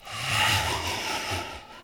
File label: breathing; Left 4 Dead 2; npc; infected; idle